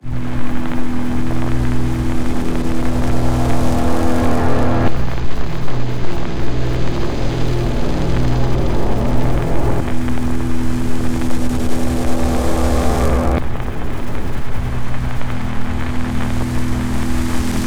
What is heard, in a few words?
texture cyberpunk, drone pad texture